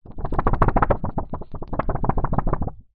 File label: foley paper thick sheet wobble